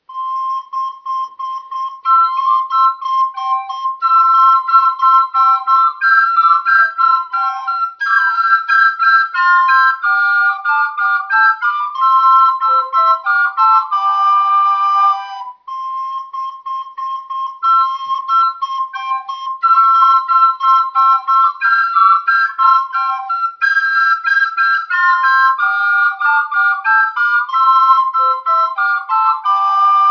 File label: menuett; spielfolk